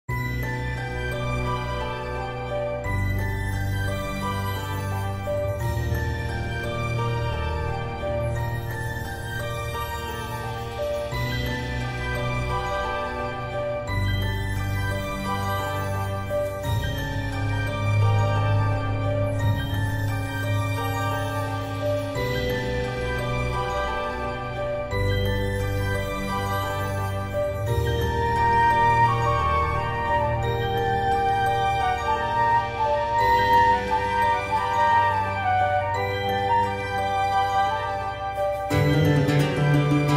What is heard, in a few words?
Orchestral Arrangement; Ocarina of Time